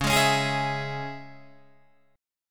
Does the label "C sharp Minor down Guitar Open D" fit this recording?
Yes